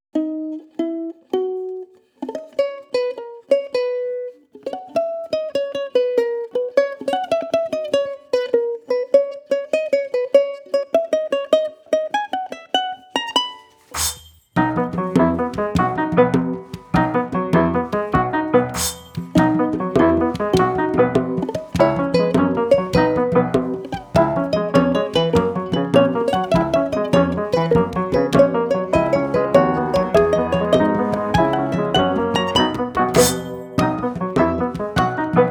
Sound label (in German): Karaoke ABC die Katz lief in den Schnee